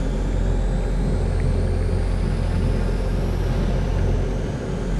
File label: colossus windup; aquatics